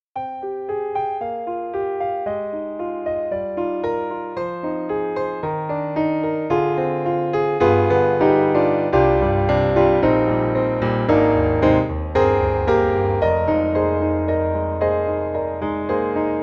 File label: Mini Grand Ballad Piano; AIR Music Technology